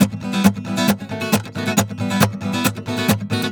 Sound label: MERENGUE, World Grooves